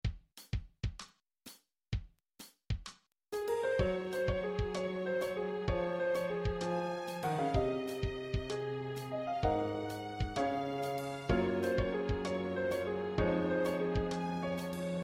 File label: Accompaniment